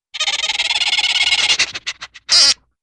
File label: Rapid Musical Scrapes Rapid Sniffs & Razz, Cartoon; Hollywood Edge; Cartoon Trax